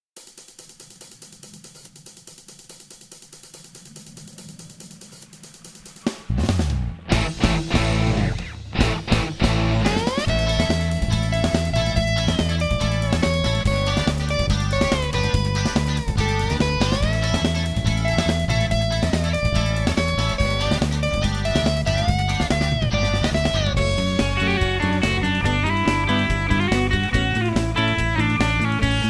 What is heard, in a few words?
polka